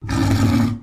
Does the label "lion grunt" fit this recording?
Yes